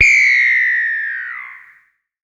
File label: Simple OSC Spring Reverb